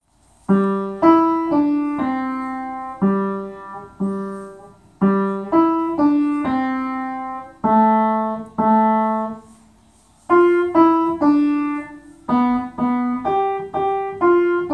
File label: Jingle Bell, Jingle Bells Played on Upright Piano